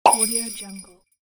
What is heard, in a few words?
pop jingle